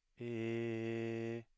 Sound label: mid tone